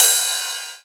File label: Ride Cymbal; Drums; House; Best Service; House Definition VOL-1; Cymbal Stuff